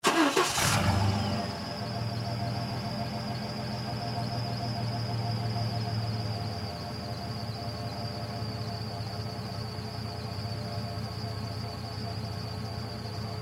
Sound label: Chevy Blazer 1996 Start Up Idle Rev Rev Off Exterior Near Exhaust Pipe; Cars